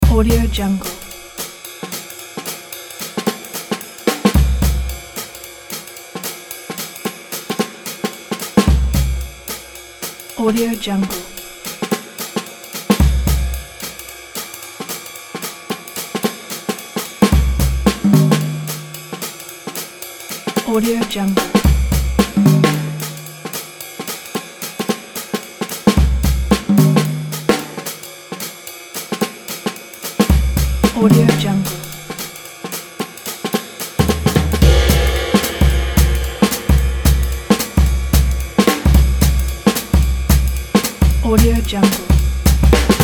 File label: jazz drums